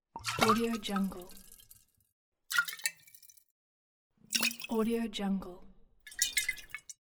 water bottle handling

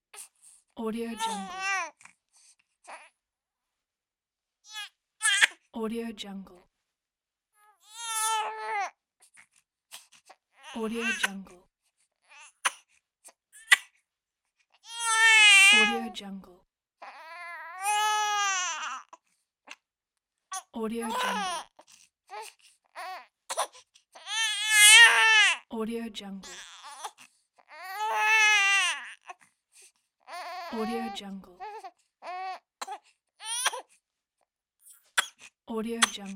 fussy baby

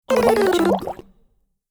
cartoon long steps down bubbles

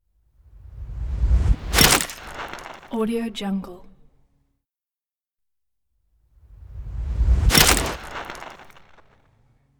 camera surreal rumbling whoosh in

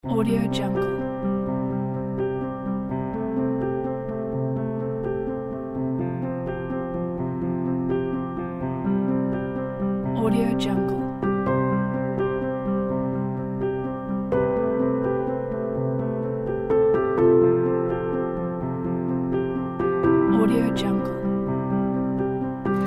sentimental indie; musicalfriend

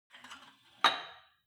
sport gym weight rack insert weight medium

